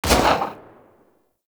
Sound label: Fire Shot, Weapons; Lato Redux